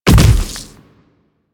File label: Infested Splitter Land Default, footsteps; Warframe; Grey Strain